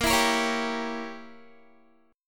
A sharp Diminished 7th, down, Guitar; strummed; tuning 4E,4A,5D,5G,5C,6F; chordshape

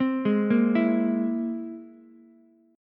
C Augmented 7th, Ukulele; arpeggiated; JGuitar

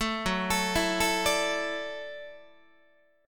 F sharp Minor 7th Sharp 5th, F sharp, Guitar; arpeggiated; 12,14,12,14,13,13; 4A,4E,5A,5D,5G#,6C#

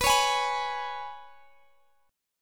G sharp Minor Double Flat 5th B

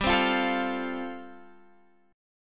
G 9th Suspended 4th, Guitar Half Step Down

